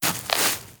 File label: Grass Turn